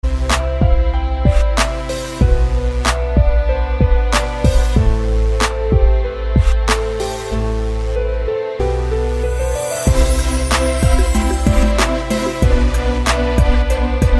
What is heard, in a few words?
Valentine Rnb Instru